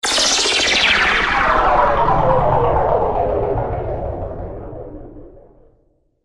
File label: Laser